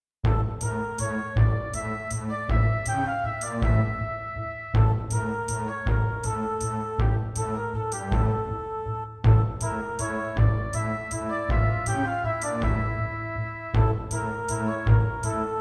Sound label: Roland SC-88ST Pro